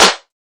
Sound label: verby snare sample G key